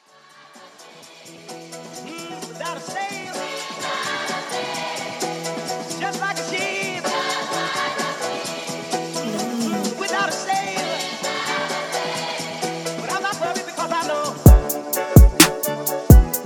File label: 128bpm Emin